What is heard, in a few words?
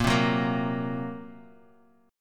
A sharp Suspended 2nd Sharp 5th, Guitar Standard; strummed; 6,3,4,3,x,x; JGuitar